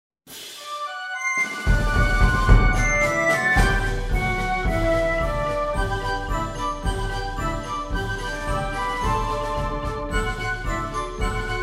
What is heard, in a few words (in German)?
trompetenecho